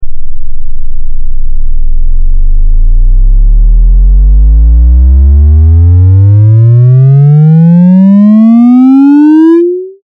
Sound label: Bass Sweep; 44kHz Stereo